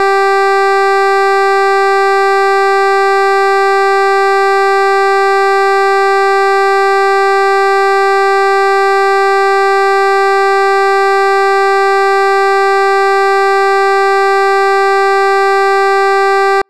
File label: error, oven; prime oven